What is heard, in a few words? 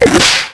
Gulper Bite; Finding Nemo; XBOX